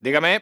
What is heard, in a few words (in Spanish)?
resp hombre; contestanto al teléfono